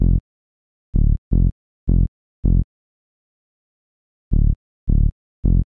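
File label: synth bass, ambient, 160, C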